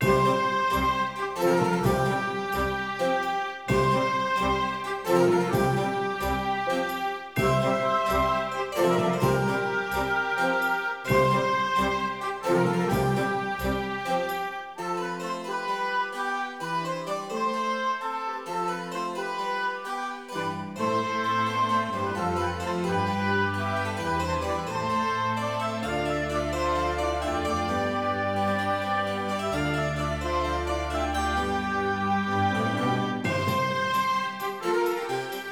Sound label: Upbeat - The court; anno 1503 gamerip